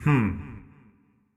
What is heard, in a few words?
Grunt; Going Under; Marv